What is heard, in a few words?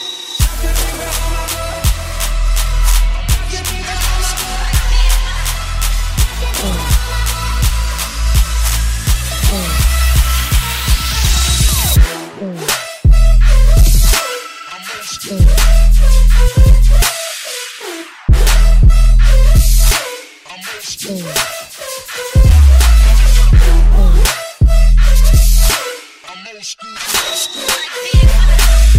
super bass